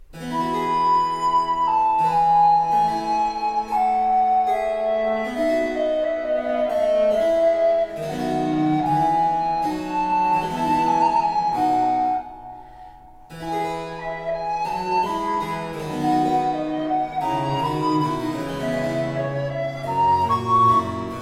Sonata I In D Minor Grave, Recorder and Basso Continuo; Francesco Barsanti; Sonatas for Recorder and Basso Continuo